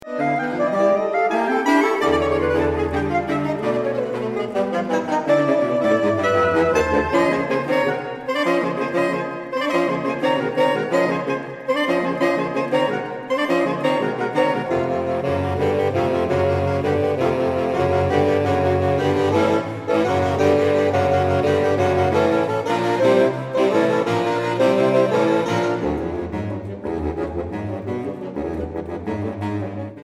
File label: glass; tetraphonics